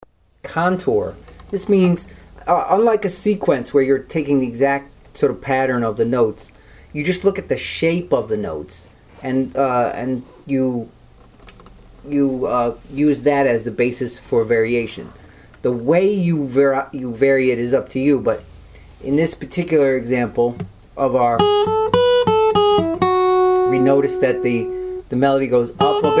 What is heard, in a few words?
varying a melody